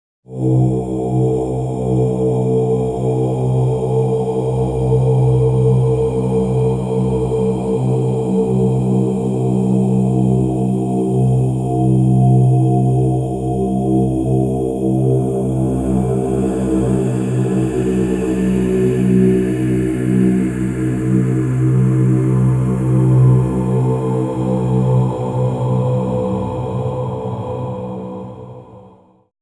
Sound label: Tibetan Chant Stretched x3; Djgriffin